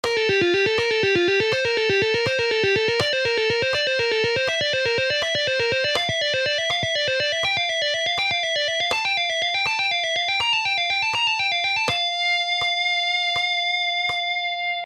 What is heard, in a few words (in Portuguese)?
Exercício tapping